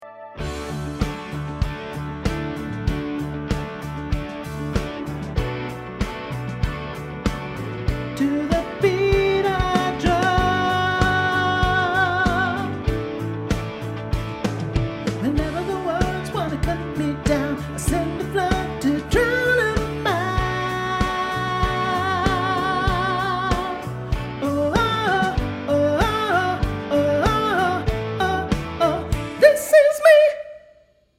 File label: descant soprano